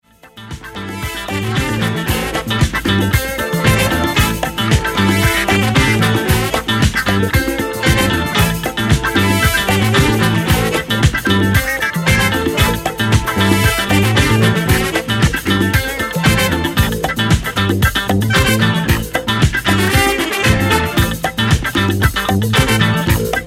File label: Instrumental